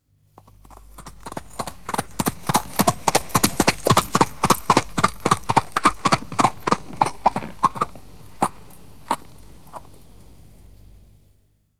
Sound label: horse trotting on turf to a stop; footsteps